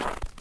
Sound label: snow step; Golden Crayon Sounds